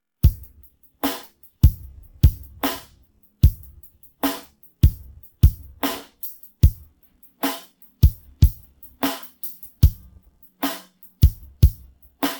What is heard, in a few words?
pattern, drums